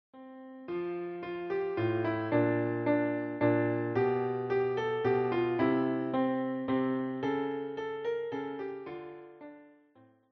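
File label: We wish you a Merry Christmas, piano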